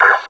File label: pain, combine soldier; hl2